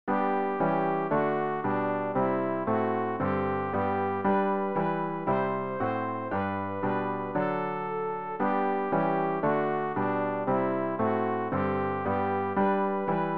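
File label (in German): sopran, Übehilfen für das Erlernen von Liedern; ref gesangbuch; rg 163 jesus herr und haupt der deinen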